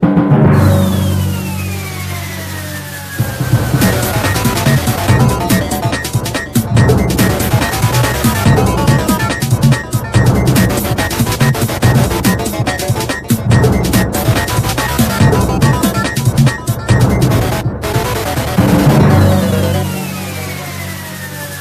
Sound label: jingle map